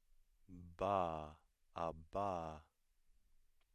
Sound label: voiced bilabial stop